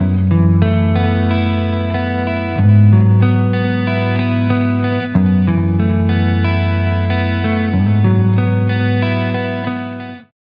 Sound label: Stereo Flanger, Faux Chorus Swirl, Guitar Dry; Sonicircuit; Syntheway